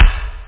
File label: room kick; kicks